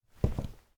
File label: footstep wood; UltimateFishing2020